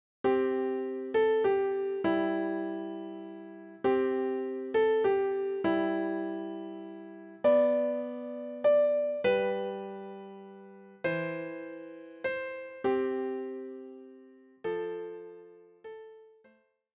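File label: piano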